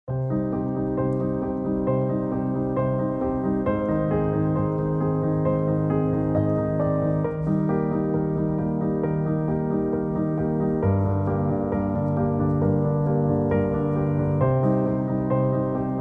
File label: inspirational music